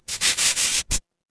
retro game power up

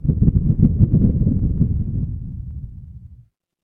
ambience thunder

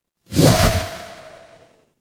transition swoosh

